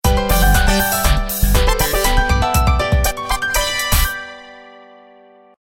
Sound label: Menu Card; Family Restaurant